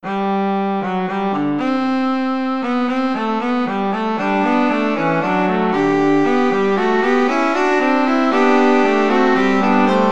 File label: fugue